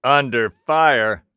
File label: Taking Damage; Realm Royale; Assassin; Six-Shot Cyborg